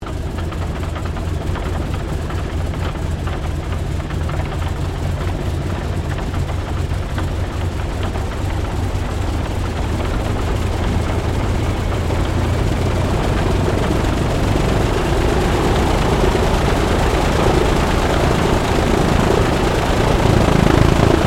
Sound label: AIRCRAFT CA-13 BOOMERANG IDLE; Airplane; Vehicles; Airplane Sound Effects